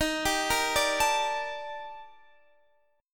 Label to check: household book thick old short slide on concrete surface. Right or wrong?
Wrong